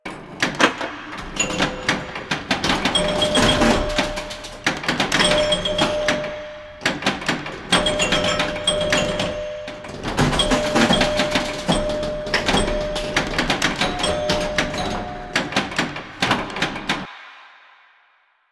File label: Pinball Finger Hits Loop; Warframe; Ambience; Nineteen Ninety Nine